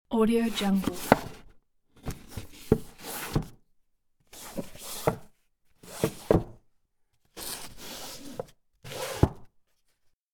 taking and putting books in library

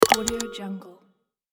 confirm button

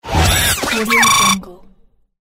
glitch

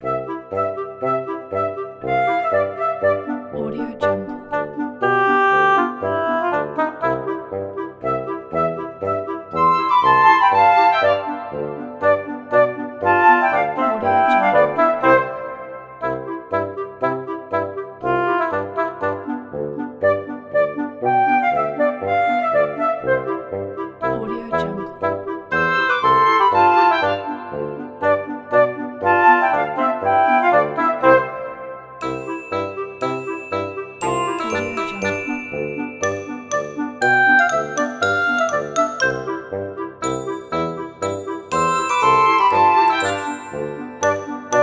kids game

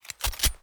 Tikka lite mag in shell eject designed

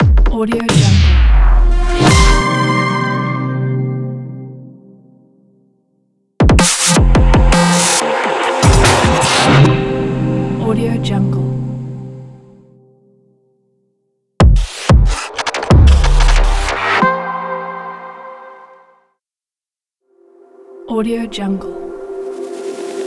glitch future beat logo

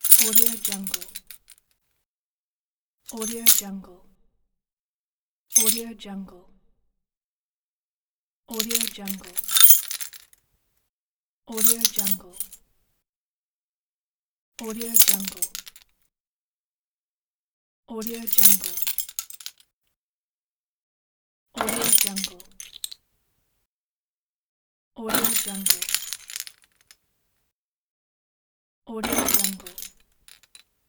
grabbing the keys from the table

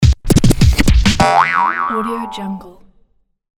funny scratch

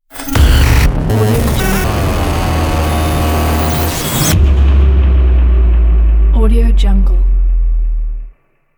electronic transition; john r1se

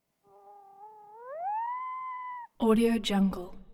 coyote barks howls cries echo

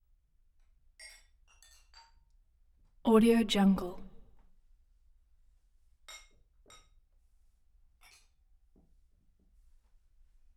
restaurant ambience; prosoundfx

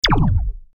puckered zap; sound ideas

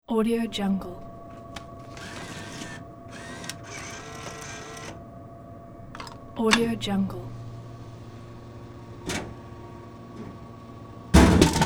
vending machine

